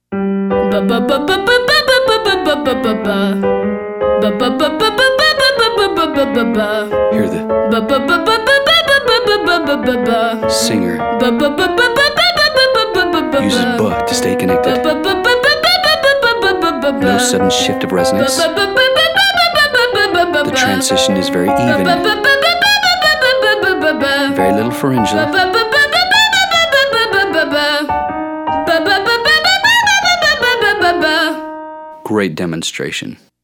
Exercise 5 Buh book females

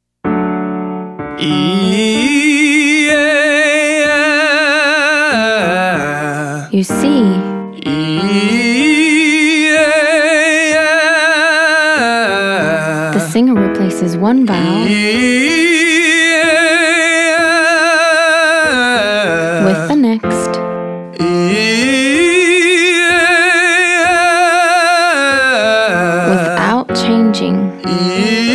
Eat to At male

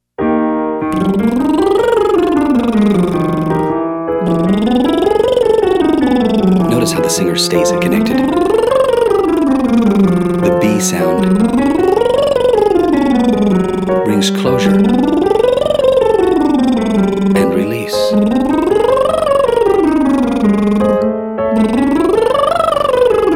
Longscale Liprolls females; Singing

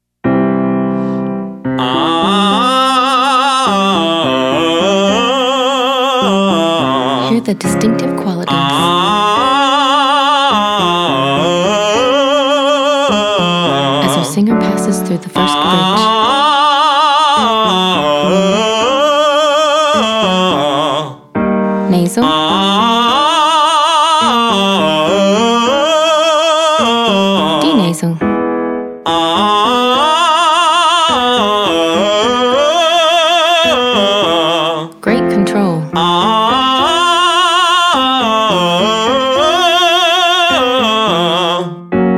Ah Uh male

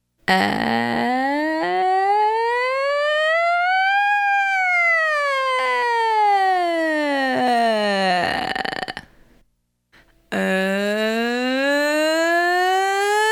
Uhhh female

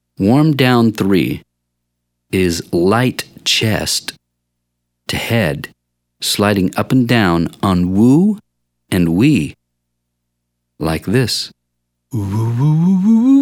warmdown OOO males; Singing

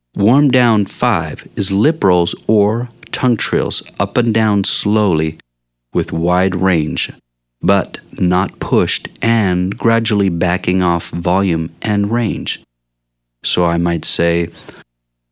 warmdown Lip Rolls males

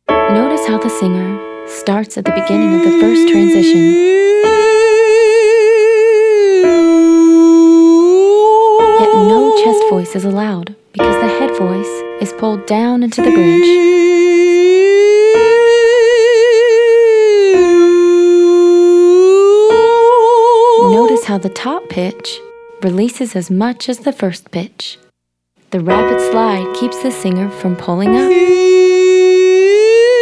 Exercise 8 example

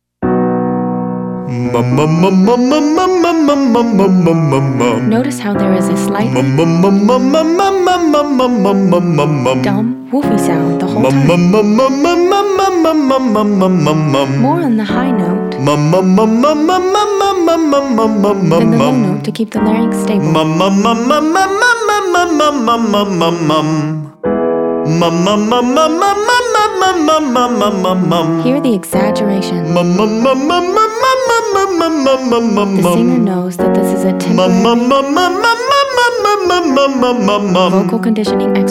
Exercise 3 mum mum males

